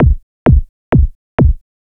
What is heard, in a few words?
Kick, Big House; Sonic Foundry (Sony Creative Software); Afterhours EDM